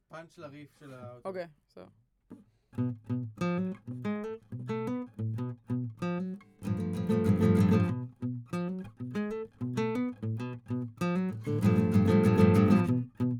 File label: GTR